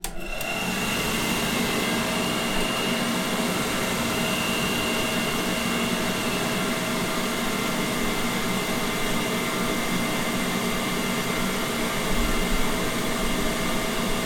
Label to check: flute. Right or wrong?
Wrong